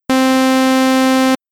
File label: Saw Up; PSYN II